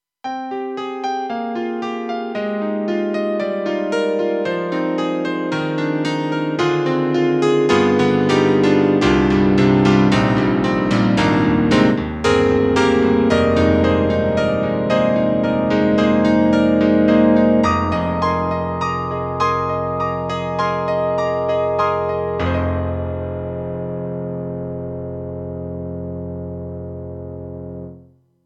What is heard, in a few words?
KORG M3 XPanded CP-8080; M3 Version 1 Wave